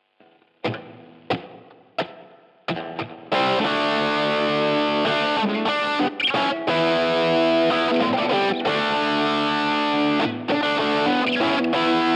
Distorted Bridge - Marshall Plexi and Tube Drive, pickups